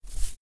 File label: leaves rustle; jibo-anim-db-animations